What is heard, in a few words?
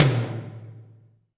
Tom Lo Media, Alesis D4